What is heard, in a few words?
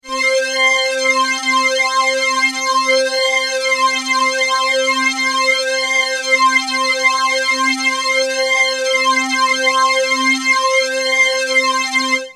RS09 ADA flanger